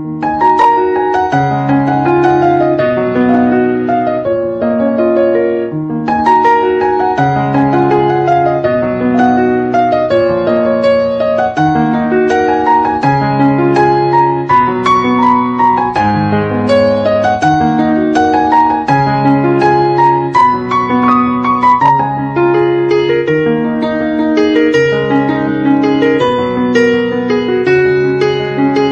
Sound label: Husband Ringtone Tamil Bgm